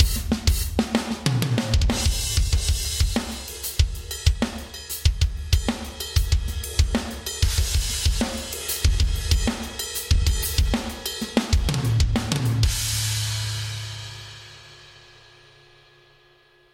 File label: Harmonic